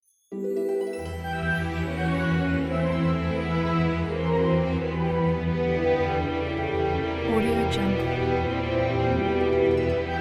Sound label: nutcracker christmas children; soundlufs